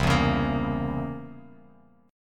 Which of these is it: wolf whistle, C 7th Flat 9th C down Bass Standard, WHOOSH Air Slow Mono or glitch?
C 7th Flat 9th C down Bass Standard